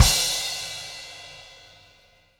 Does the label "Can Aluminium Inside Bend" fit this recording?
No